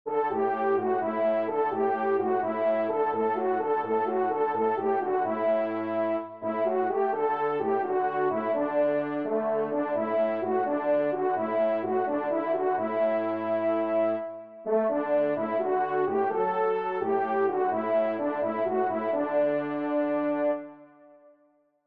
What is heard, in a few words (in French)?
trompe cors; Le Faux Revu